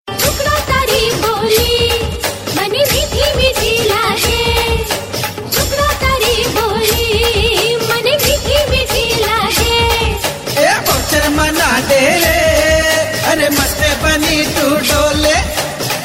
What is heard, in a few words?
Gujarati